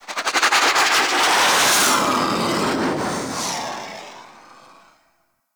Fighter Ship Attack Maneuver, Space Fighter Ship Engine; Warframe; RailJack; Enemy Ships; Grineer